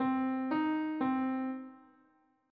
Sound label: minor 3; piano